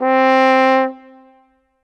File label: Horn, Cinematic; Sonic Foundry (Sony Creative Software); Orchestral Series VOL-3; Cinematic 21